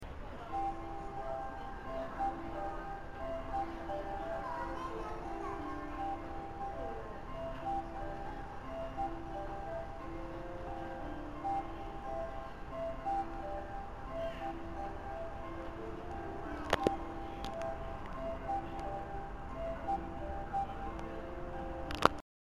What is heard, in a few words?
taipei ambient